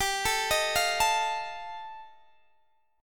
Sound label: G 7th Suspended 2nd Sharp 5th G chord, Guitar Open D